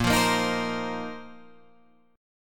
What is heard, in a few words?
Bb Suspended 2nd Suspended 4th Bb Guitar Open G; strummed